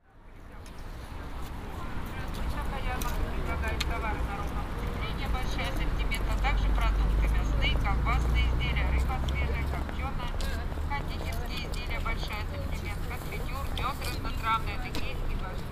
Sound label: fair; sergiyev posad